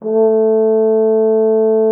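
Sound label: French horn A3; Xsample VOL-3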